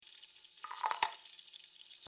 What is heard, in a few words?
Washing dishes